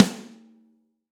Snare Stick Hit

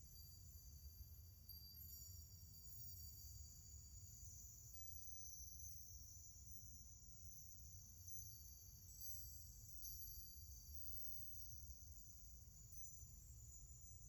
Floating Symbol Ambience; Journey

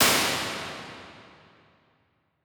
Hybrid Plates Classic Plate, Impulse Responses; Ableton Live 12 Lite

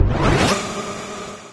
hovercraft start up; There; ThereClient

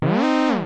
Alarm LOOP; MMX

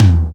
Tom 505; Drums; One Shots

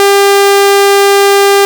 Ob Saw, Synths; Multisamples; Ableton Live 12 Lite; Core Library

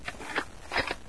reload, paintgun; There; ThereClient

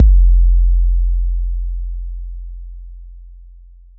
Kick 808 Shot

